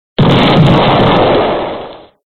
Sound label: cheeks explosion